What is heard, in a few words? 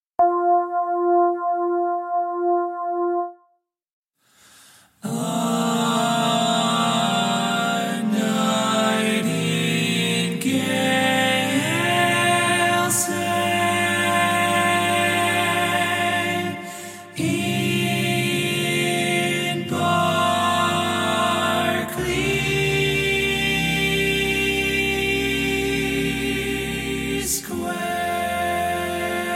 barbershop tags